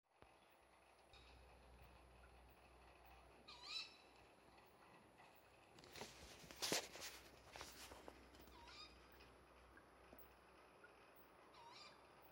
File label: Nighttime animal noise